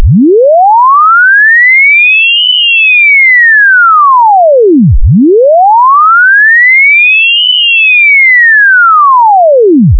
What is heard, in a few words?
alias 6k 12k